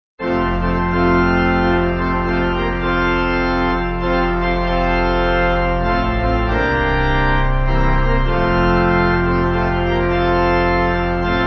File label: We Have Heard, Pipe; Kirkpatrick